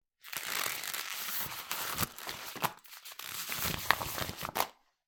ゴム手袋を外す音

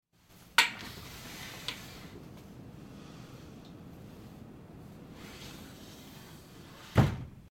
錆びれた窓の開閉音; window

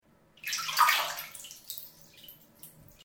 お風呂で一緒に入ってる人の腕をゆっくり離す音

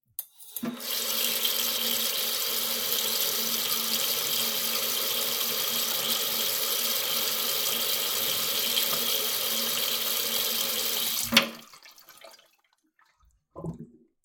蛇口から水が出る音

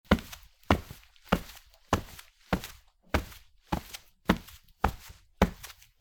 畳の上を歩く音; walk

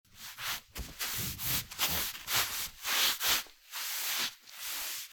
畳の上をすり足で歩く音; walk

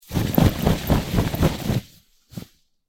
布をバサバサする音

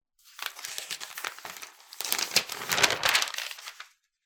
新聞紙めくる音

